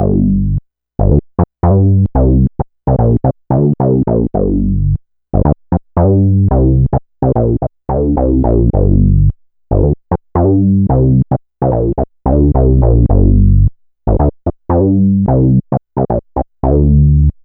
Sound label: Synth loop 110 Gm; Synth Bass Loops; Slate Digital BASSHOLE Sample Pack